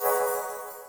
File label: MOVE; C64 Dreams; Multi-Sound Default